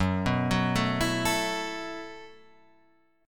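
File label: B 7th Suspended 4th G flat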